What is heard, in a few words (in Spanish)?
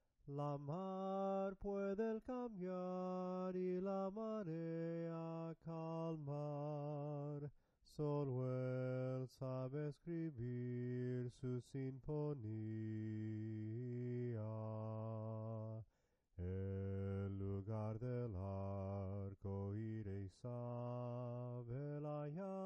SOPRANO CANTADO; SOLO EL